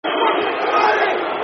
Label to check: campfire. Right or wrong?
Wrong